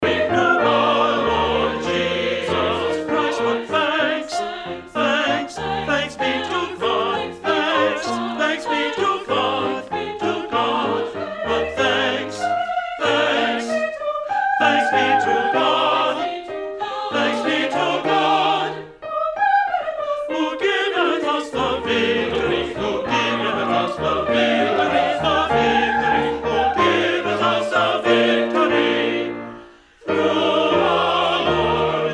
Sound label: Thanks Be To God, Tenor